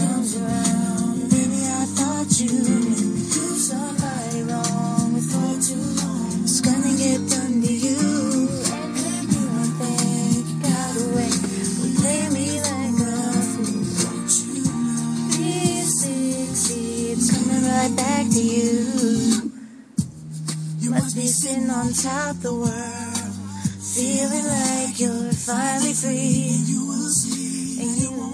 karaoke

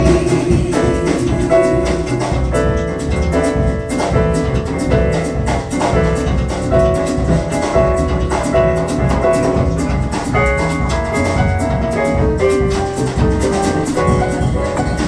jazz

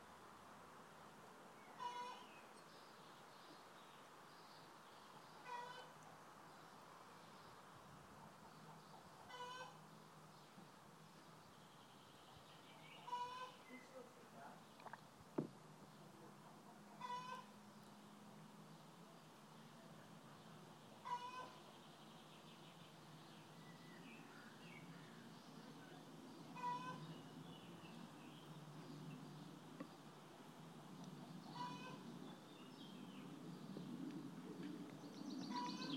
nextdoor s hen laying an egg

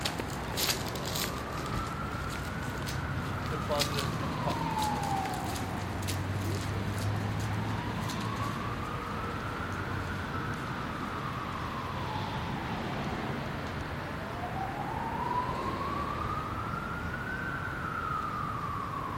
siren

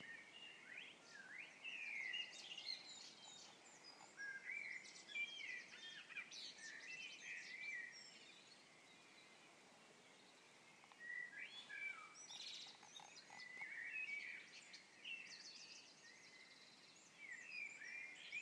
birdsong good morning

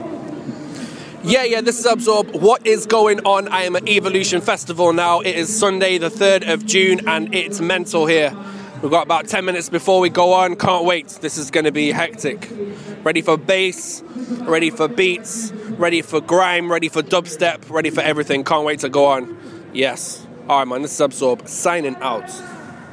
noise set at evolution festival

